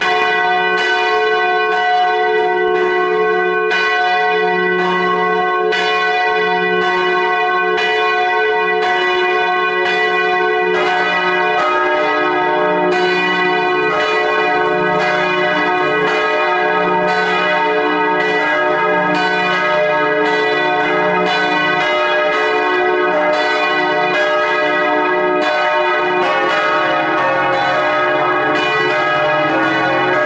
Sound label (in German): Glocken st jakobi plenum; glocken jakobi kirche